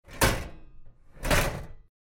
metallicheskij shkafchik

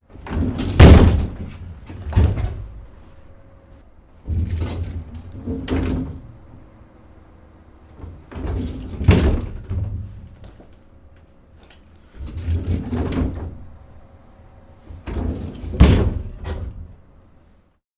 dver v kupe

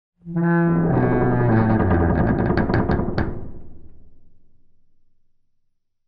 gulkij skrip

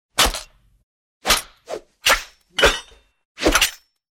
zvuki razrubanija i svistov; Звук мечей; Звуки сражений на мечах, звук ударов меча

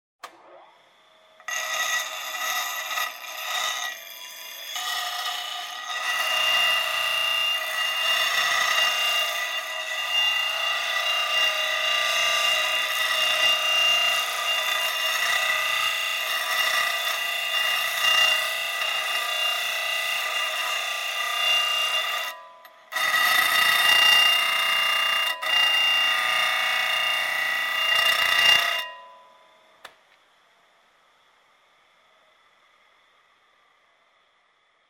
jel shlifovalnj stan

